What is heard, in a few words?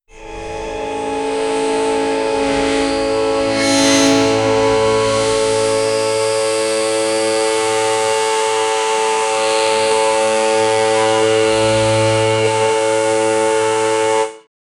bee buzz becoming a violi